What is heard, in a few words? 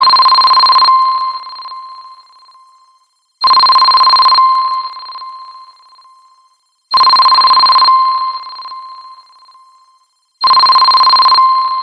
classic cell ring